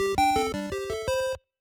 LINE Call; 主播女孩重度依赖

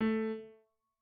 piano

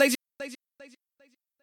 voice

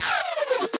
wobble

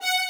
violin

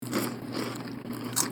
(Eating); Marisa

